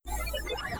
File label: Hoverbot Alert; FPS; Enemies; FirstMicroGame